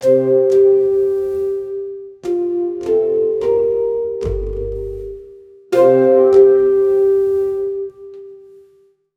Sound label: instrumental para un docu, documentary